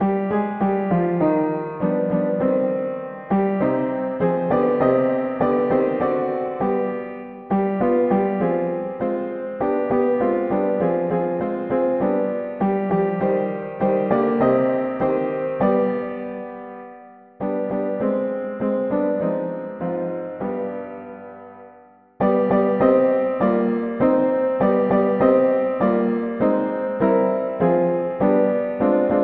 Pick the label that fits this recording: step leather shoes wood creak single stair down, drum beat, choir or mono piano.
mono piano